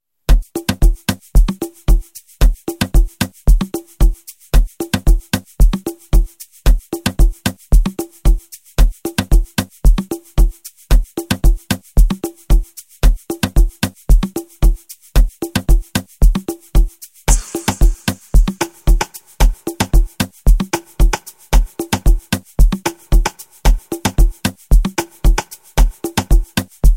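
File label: Amapiano Mix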